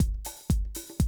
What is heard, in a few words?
120, Classic Drum Loops